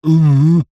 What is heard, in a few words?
human male middle aged rude are you thick type grunt